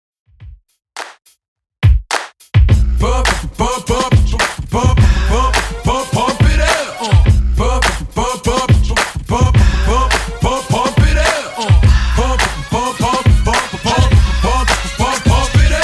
Hiphop DJ Friendly Redrum 105bpm; remixplanet

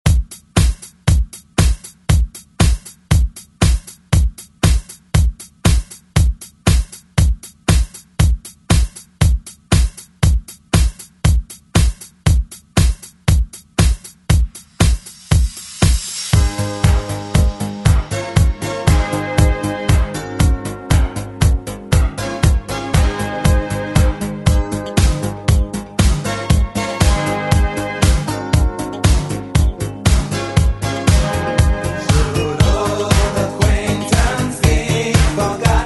80s ReDrum; DJ Mhark

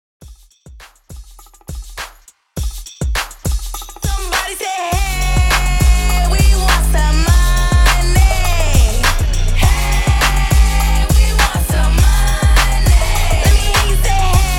PARTY BREAK, 102 BPM

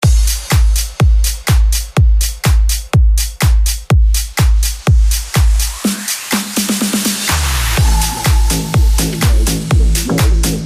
future bootleg 124 bpm

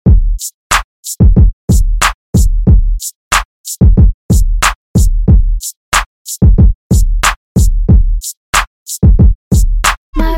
Funk No Scratch Remix 92Bpm

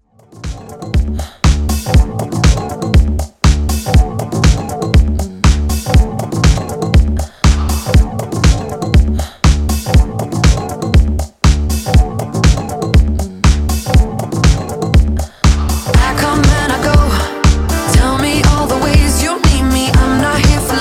Intro Outro 120bpm

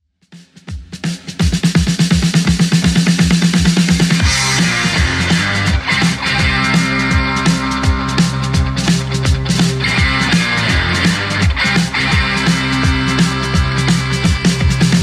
70s Ext Redrum 168 bpm CLEAN

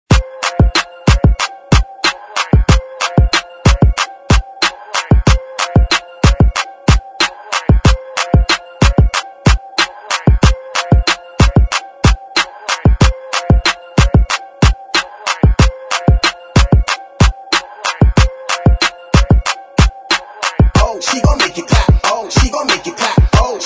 Clap Clapella

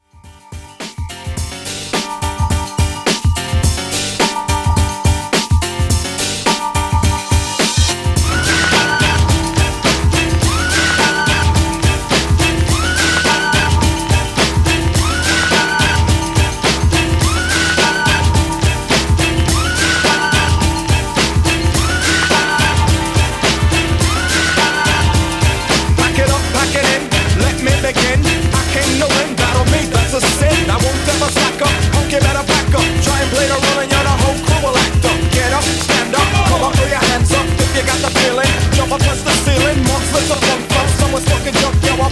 Blend Old School, 106Bpm

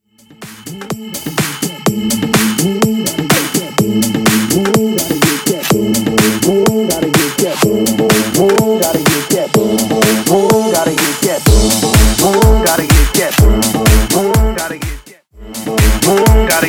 Bass House 125bpm

